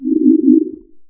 nav buoy

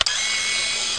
W Sniper Zoom; Requiem

